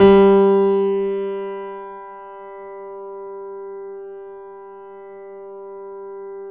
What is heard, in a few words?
G 2, Keyboards Acoustic, GRANDP ST; USB Soundscan vol.17